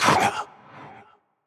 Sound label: Death; Astro; Paladins